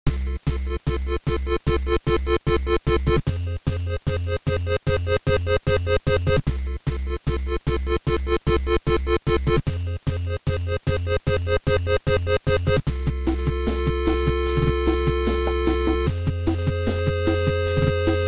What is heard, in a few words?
bgm tension